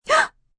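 Gasp Short Female, Gasp, female, short (human voice); Gasping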